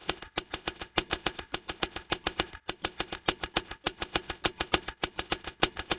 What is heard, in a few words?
drum; Separation of Sound Sources by Convolutive